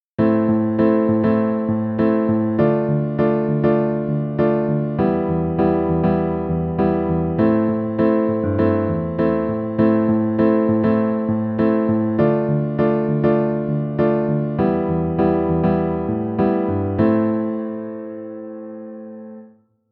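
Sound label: minor chord piano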